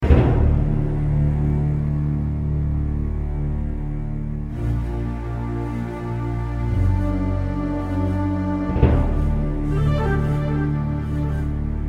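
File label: sounds of endings outro, disappearance fading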